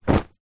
foley clothes shorts drop carpet

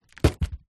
cardboard box parcel large lean on wall; foley

